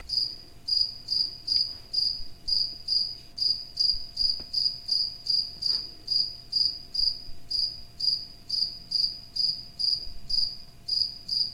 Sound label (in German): Grille